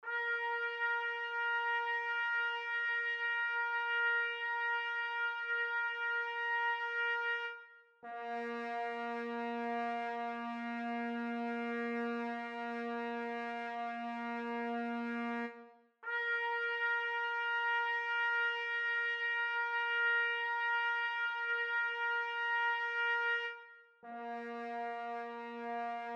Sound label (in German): Stimmton 442Hz